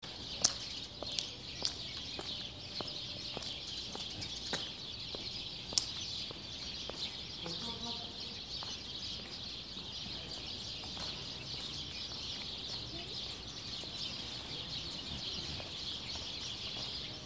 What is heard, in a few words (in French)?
les oiseaux dans larbre